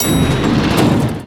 door; Shin Megami Tensei IV Apocalypse; 3DS

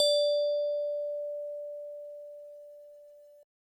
Elevator Bell; Cruelty Squad

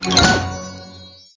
Cat launch Highscore

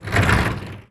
transition cannon move

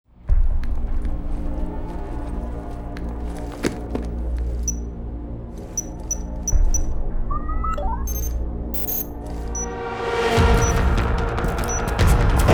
Port Explosion; deus ex human revolution

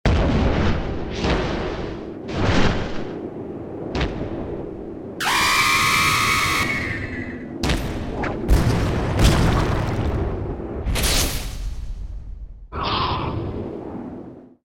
Appear

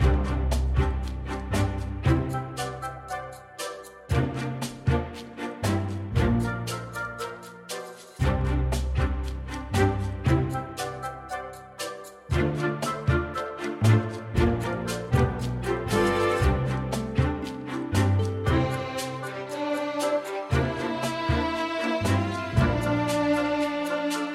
Ingame Loop; Scrubby Dubby Saga; Android

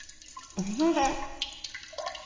Peeing look back

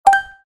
Percussive on; WeMod

